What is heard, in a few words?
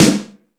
All Snares, Drum Machines